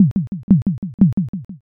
slot stop

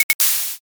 lighter

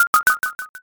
chip

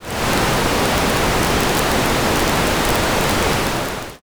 fire

